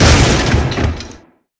rocket break wall; svencoop; rngremake

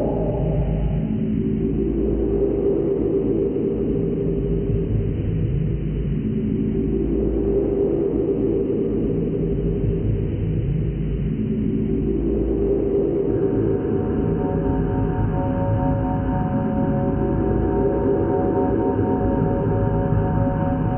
comp moan loop; svencoop; rngremake

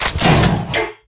item pick; svencoop; rngremake; items

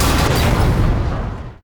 platform close; svencoop; rngremake

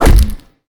fist hit wall; svencoop; rngremake

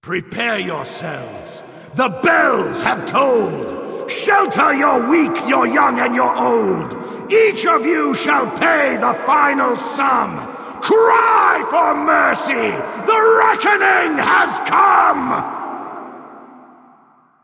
horseman bomb; svencoop; keenhalloween; horsemen

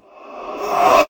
fist voice prepare, weapons; svencoop; rngremake

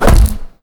kick hit wall; svencoop; rngremake

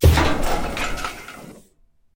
hatch open; svencoop; rngremake

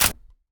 pistol empty, weapons; svencoop; rngremake

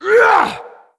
horseman attack; svencoop; keenhalloween; horsemen

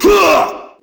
fist voice kick; svencoop; rngremake

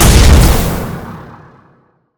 beam explode; svencoop; rngremake

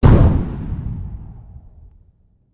lights off; svencoop; htc

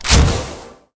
ammo energy; svencoop; rngremake; items

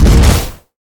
beam hit; svencoop; rngremake

